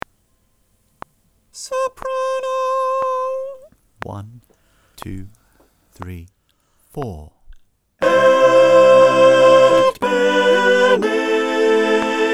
sop click; Adoramus Te Part 2

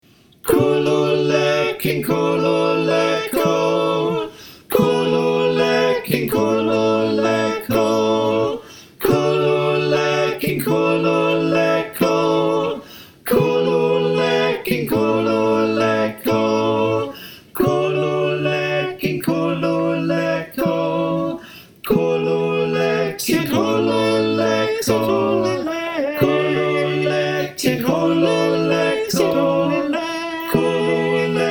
SATB, choir

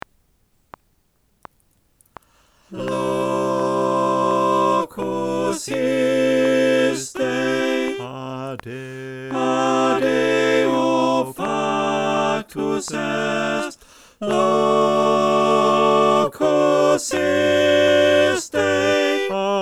satb

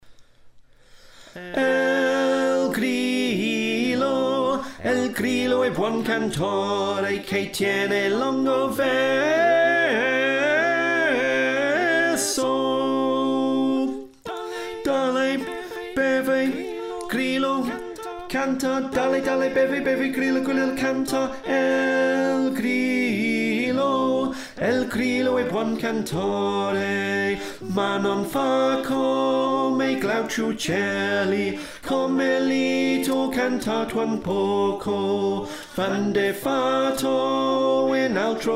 Tenor

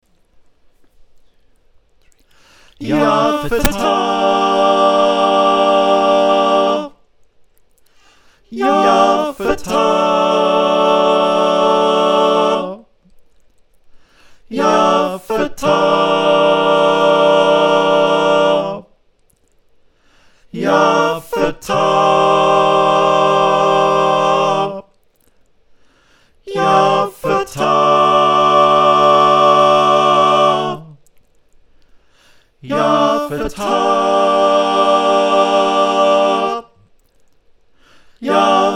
SATB

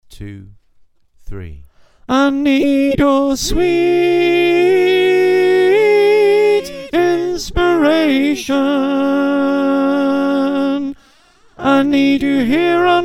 Alto, choir

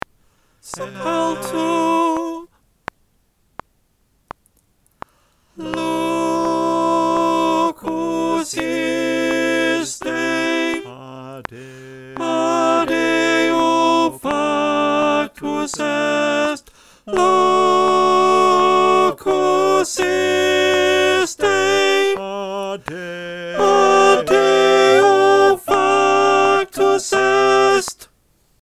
alto

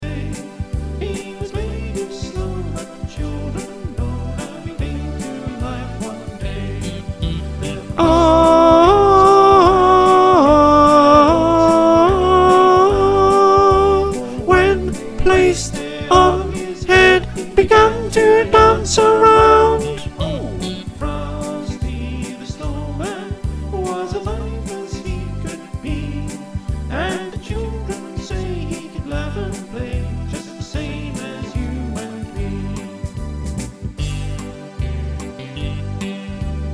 Soprano, choir

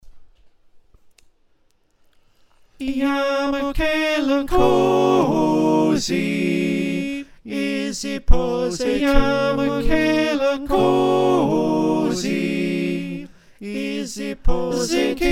SATB; Zincini Nkosi

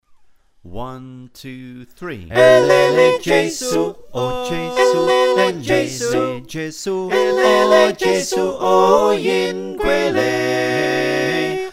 SATB

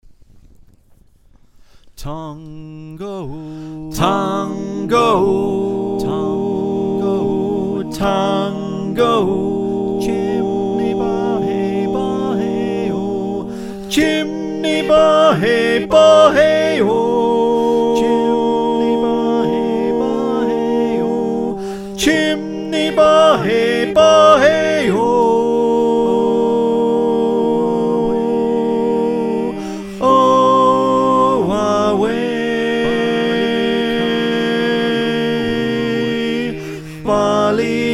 Tenor, choir

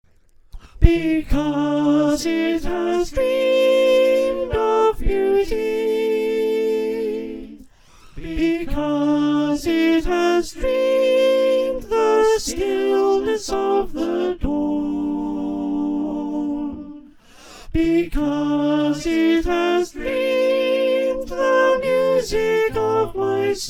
up a tone in F Sop

